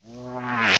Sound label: yup, zaps and beeps